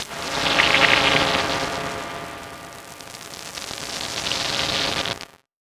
Production Element Title Transition Liquid

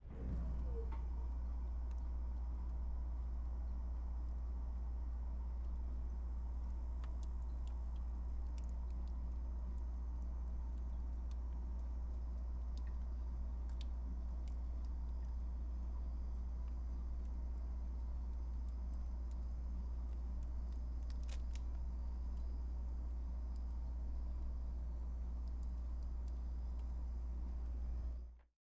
Freezer Running Door Open; household

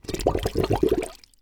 Liquid Water Glass Submerge Tall Glass In Water

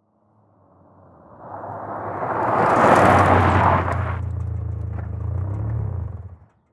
Auto Saturn Pull Up Stop Dirt Road Fast; Transportation

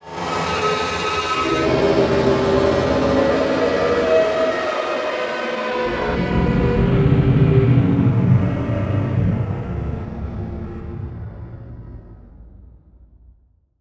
Title Transition Evolver Long; Production Element

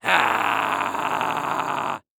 Horror Scary Human Yell Scary

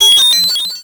Title Transition Data Transmission Computer; Adobe; Production Element

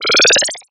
Multimedia Internet CD-Rom Flash Hi-Tech

